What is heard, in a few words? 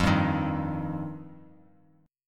F 7th Suspended 2nd F down; F7sus2; strummed; JGuitar